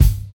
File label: natural steel kick drum sample F sharp key, Royality free kick drum tuned to the F# note